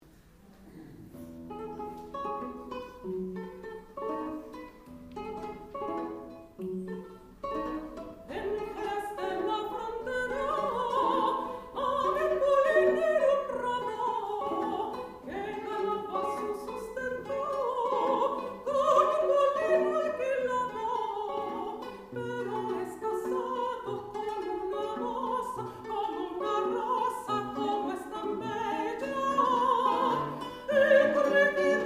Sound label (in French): Chant Espagnol